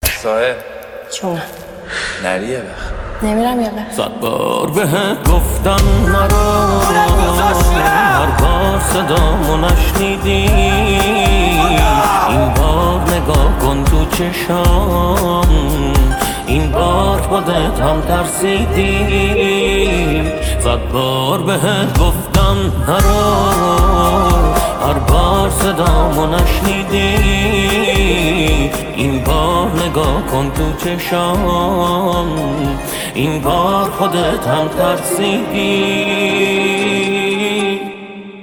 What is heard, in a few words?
Remix